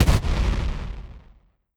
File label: cannon fire